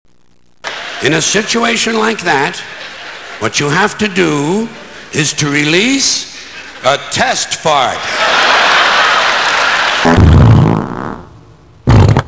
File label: fart